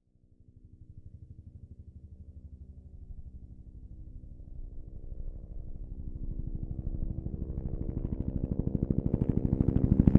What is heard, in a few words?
Fast Helicopter By, huey